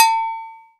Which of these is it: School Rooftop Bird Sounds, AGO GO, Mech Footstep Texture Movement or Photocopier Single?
AGO GO